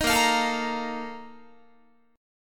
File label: Bb Major 7th Suspended 4th, Guitar